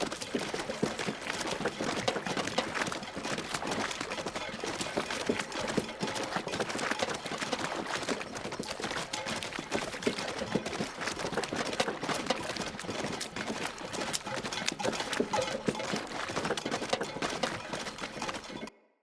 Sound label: Corrupted Battle Dog Run Loop Water, Footsteps; Animals; Foley